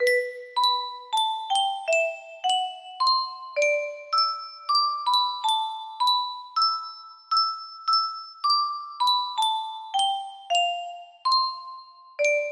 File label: melody; pinocchio